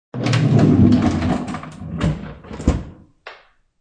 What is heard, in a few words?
Drawer on rollers opening.; Rolling Drawer Open; Drawers; Household